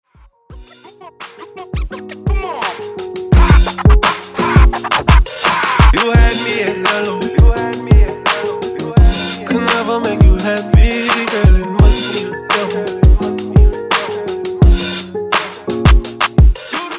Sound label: Funk; Clean N Dirty